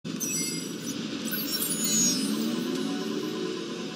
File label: hand link project